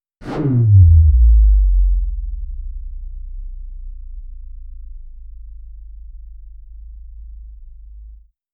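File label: futuristic electric vehicle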